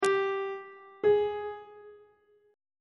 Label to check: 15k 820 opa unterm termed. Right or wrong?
Wrong